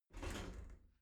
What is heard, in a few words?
shower slide door slide close